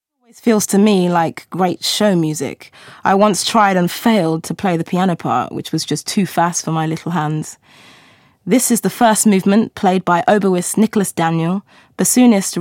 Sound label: trio for oboe bassoon and piano 1st movement presto; Francis Poulenc